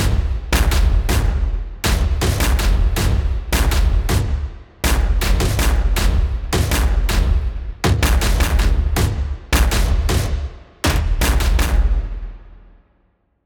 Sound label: Drum 80